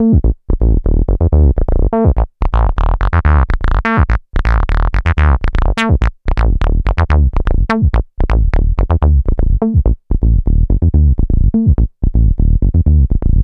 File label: bass loop, 125; Houseworx